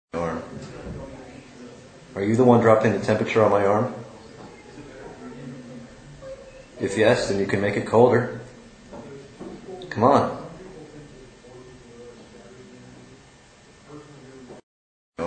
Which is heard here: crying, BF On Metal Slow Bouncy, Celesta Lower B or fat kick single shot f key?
crying